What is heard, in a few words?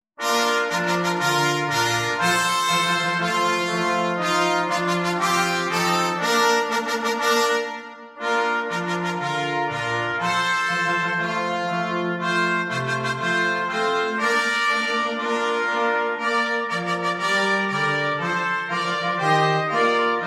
Eb Triplet Tune; simplybrass